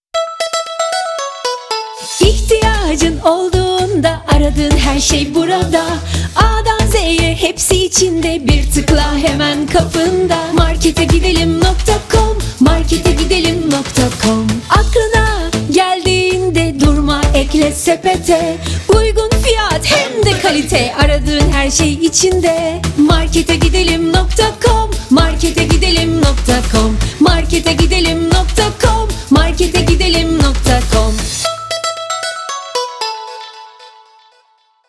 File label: jingle